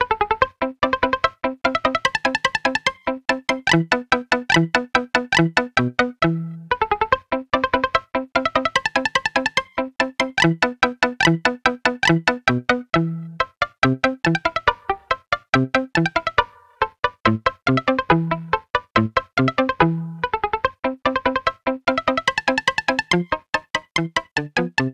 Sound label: palm muted guitar